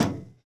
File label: catwalk step; Golden Crayon Sounds